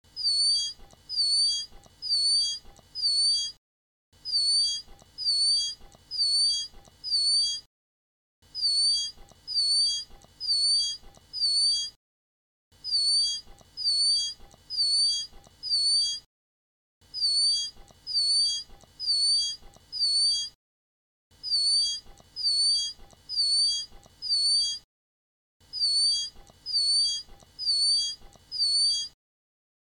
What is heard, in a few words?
tiger thrush